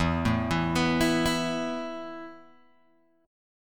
E Suspended 4th E, down, Guitar, Open G

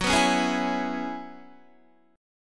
F sharp 9th Suspended 4th F sharp Guitar; F#9sus4 Chord; strummed

